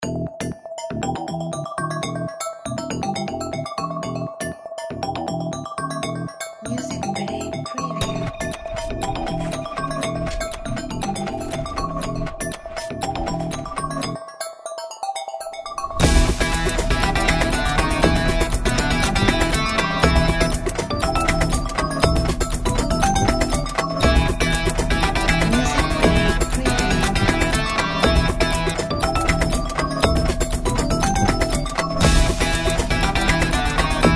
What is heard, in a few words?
Mechanical